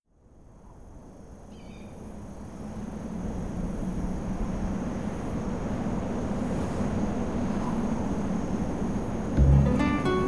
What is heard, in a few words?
Soundscape Version; The Legend of Zelda Ocarina of Time